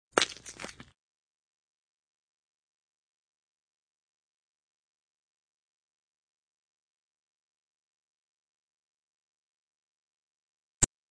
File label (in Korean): Fights, 싸움-전투; 헐리웃특수효과음